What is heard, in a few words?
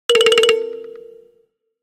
Kalimba, phonetones Nokia N8